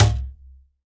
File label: MIXED DRUMS, World Instruments